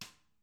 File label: snare, Interactive Drum Kits; Sonic Reality